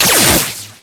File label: Trip Laser Impact; Impacts; Warframe